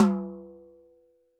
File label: TD-20 Tom B, Roland TD-20 Toms